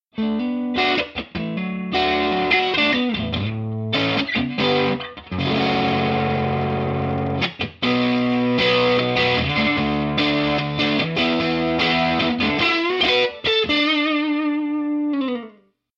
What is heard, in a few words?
overdriven